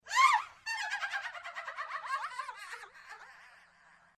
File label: witch halloween